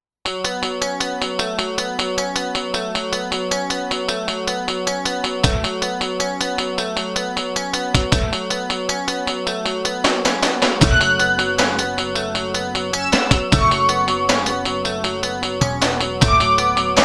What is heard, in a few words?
Emax factory; zd 702 crimsonite